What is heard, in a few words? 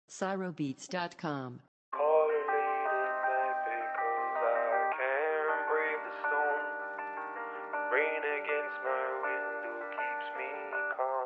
Type Beat